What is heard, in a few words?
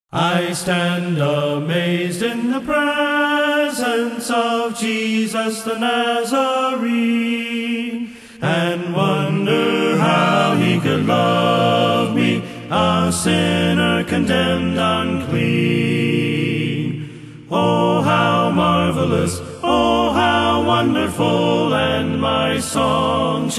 A Cappella